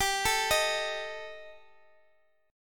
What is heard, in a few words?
G Suspended 2nd Sharp 5th, Guitar Open D; chordshape; down